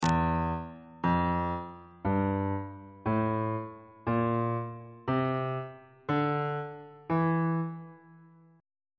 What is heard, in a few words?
f flat locrian mode note positions on bass clef